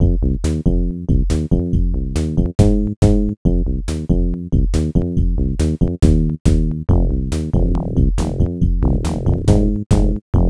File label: pc funk; wolfenstein 3d